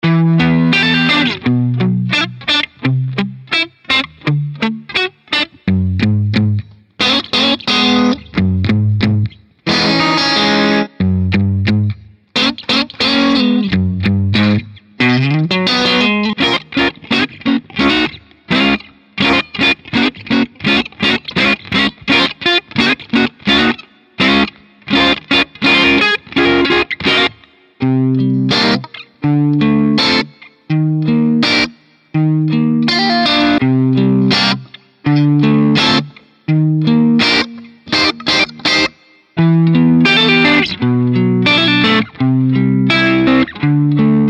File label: Funky RB Guitars